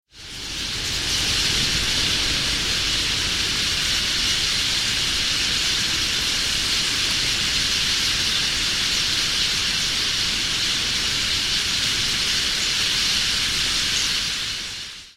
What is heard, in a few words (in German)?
bergfinken